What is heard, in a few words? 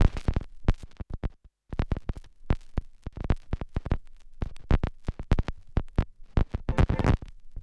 Turntablism; Sonokinetic - The Roots Drumloops